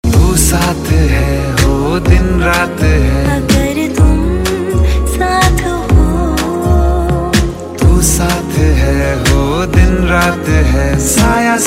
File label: Bollywood Ringtone